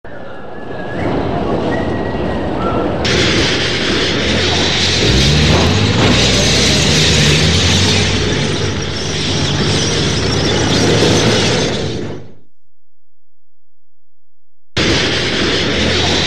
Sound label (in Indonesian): hujan abu gunung galunggung; Badan Penanggulangan Prov Jabar; 1982; FEATURE GALUNGGUNG ( PERTANIAN ); Prancis